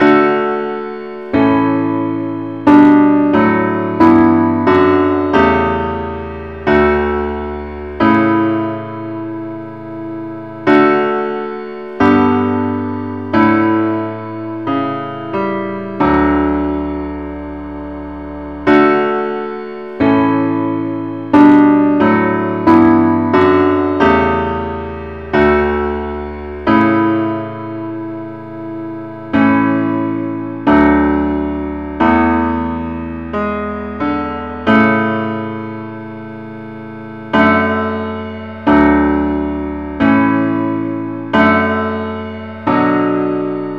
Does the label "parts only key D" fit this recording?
Yes